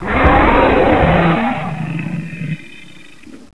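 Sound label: die, alien controller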